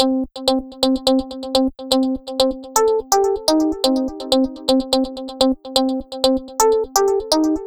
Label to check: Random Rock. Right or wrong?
Wrong